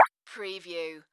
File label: Multimedia Percussion